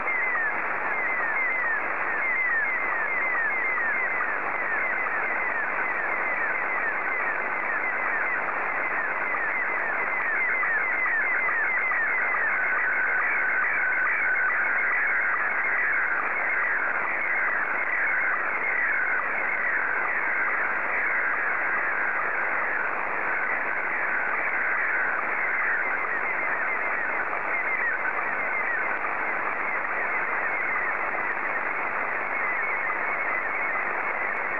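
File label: SSTV, Scottie 1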